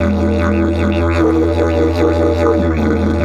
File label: E 150, DIDGE RIFFS